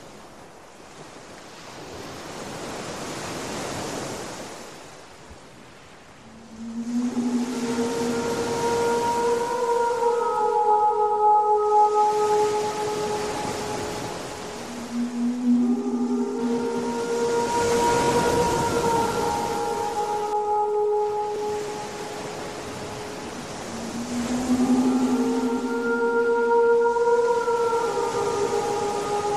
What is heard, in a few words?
Light house siren